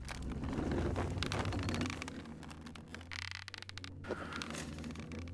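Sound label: Work Boat Ancient Work Rest; Civ4 warlords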